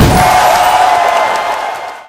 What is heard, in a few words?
Movement American football score; Talking Tom Gold Run